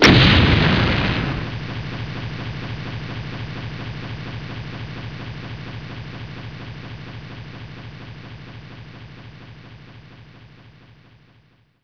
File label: Explosion